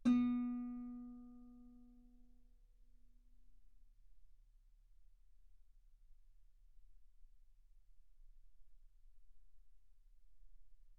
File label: Guitar ff B3; sul B